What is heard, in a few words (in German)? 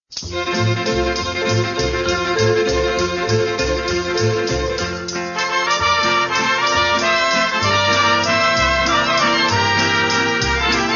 mexikanischer Walzer; wilder westen heisser orient